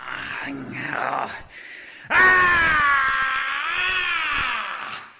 el grito de guerra

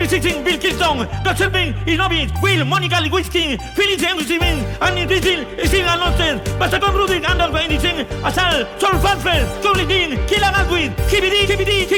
rap

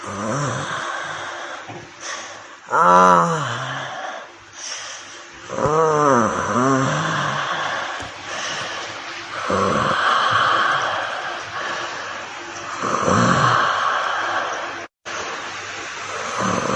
gemidos

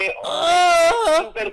sonido perturbador

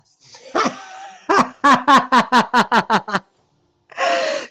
ahjajajaj risa

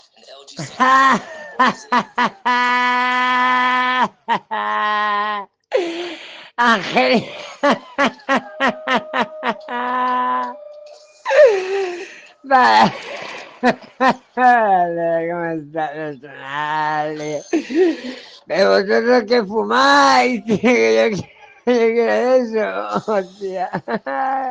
jajajaja

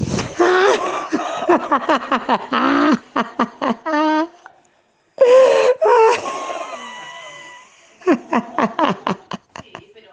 risa ostia que fuerte

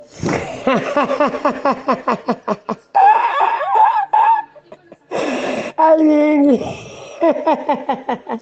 jajajaj risa

